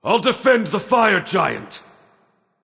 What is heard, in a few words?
Self Defend; Spectral Sword Ares